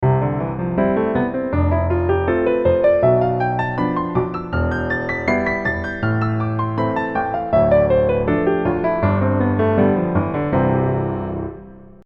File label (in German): Tonleiter mit Begleitung